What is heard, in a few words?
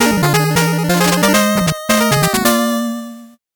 8bit jingle slow